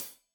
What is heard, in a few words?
Hat Tight, Hi-Hat, Cymbals